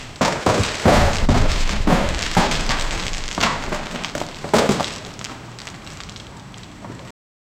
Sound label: a continuous chaotic barr, chaos